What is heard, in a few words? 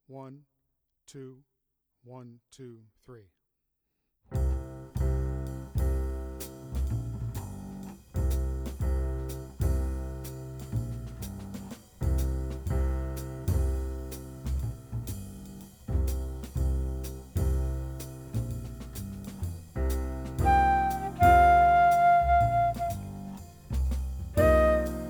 flute